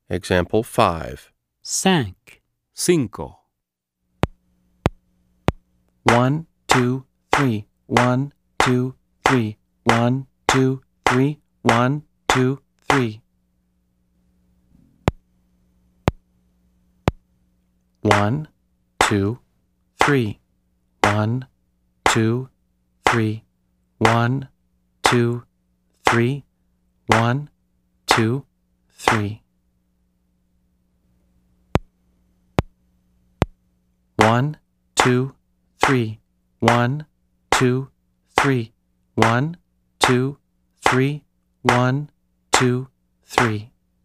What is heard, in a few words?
Keyboard